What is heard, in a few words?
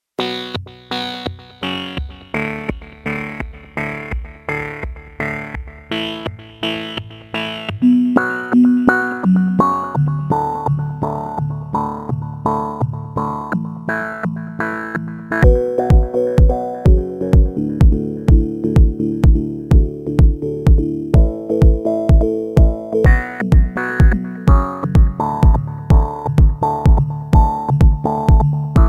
Trance SMS